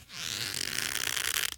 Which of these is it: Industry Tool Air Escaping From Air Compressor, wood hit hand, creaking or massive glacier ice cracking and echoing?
creaking